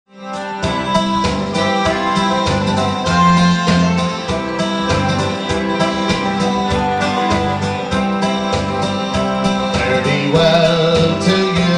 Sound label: Irish Leaving Of Liverpool, sings irish songs